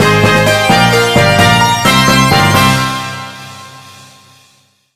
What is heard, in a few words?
Victory jingle; Kim Possible Kimmunicator